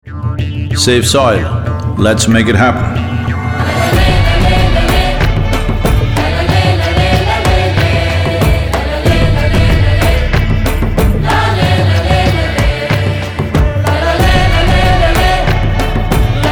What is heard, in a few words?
save soil song